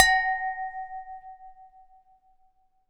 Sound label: Glass Clink, Beer Glasses; Miscellaneous Beer Glasses